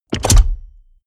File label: mechanics large lock